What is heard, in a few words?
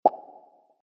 pop sound effect